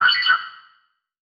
Title Transition Data Transmission Blurp; Production Element Title Transition

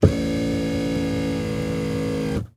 Truck GM Envoy Adjust Seat; transportation

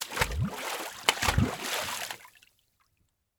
Liquid Water Water Hand Smacks Water

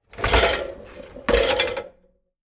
Dishwasher Bottom Draw Open With Dishes; household

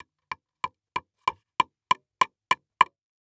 Industry Tool Hammer Nails Into Wood

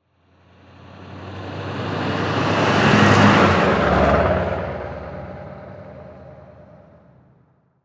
Diesel Truck By Slow; transportation

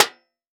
Impact Sword Impact On Metal Shield

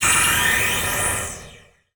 Title Transition Blast Energy

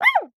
Animal Mammal Herbivore Panda Bear Bark; animals; AdobeCC

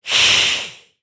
Horror Scary Vampire Hiss

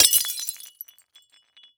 Household Glass Vase Broke By Hatchet

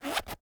Technology Vinyl CD Case Zipper Close

